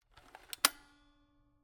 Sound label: Camera, Kodak EK2 Instant, polaroid, large, plastic, springs, reset; Eiravaein Works; Vaeyan IV